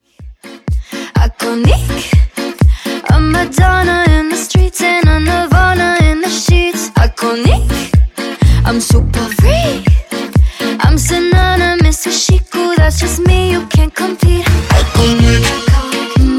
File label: 124 bpm